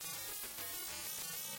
Game Over; Golden Axe; Genesis

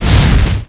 ROCK

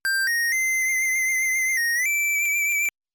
Whistle; Wily Wars

